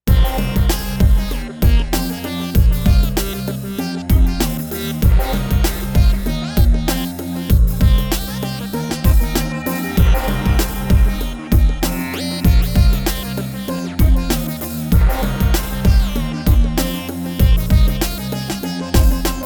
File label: ECC83S Tubes Full